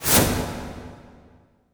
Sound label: IMP WIPE, Cinematic Impact